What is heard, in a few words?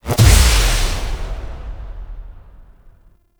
Grimoire Alt Impact; Warframe; Tenno